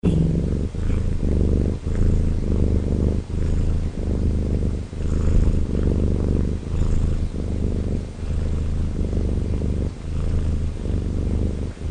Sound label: Purring Domestic Cat; 16bit 44Hz